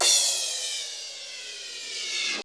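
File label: cymbal reverse, FX PERCS; Crypton - DrumBase5000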